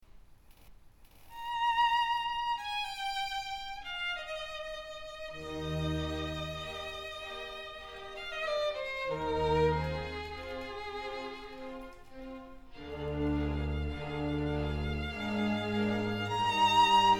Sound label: Violin Con Bf